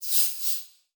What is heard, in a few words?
Multimedia Internet CD-Rom Flash Whoosh Multiple